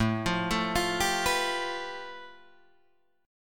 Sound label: A 7th Suspended 2nd Sharp 5th A, Guitar Drop D